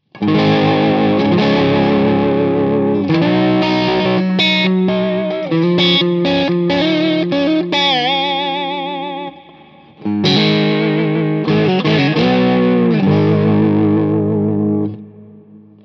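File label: Vintage Crunch Suhr Modern Tele Rhythm Lead, guitar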